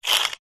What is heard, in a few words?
foley money australian 5 cent coin slide short on tiled table; zapsplat